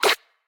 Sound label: Join Hit; boomerang fu